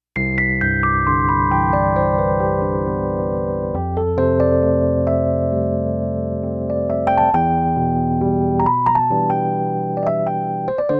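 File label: tremolo electric piano; RD-800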